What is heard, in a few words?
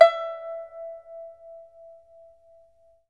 PIPA E6; PIPA TREMOLO; Spectrasonics; Heart of Asia